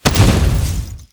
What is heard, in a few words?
Armored Kodo No Vox Death Underlay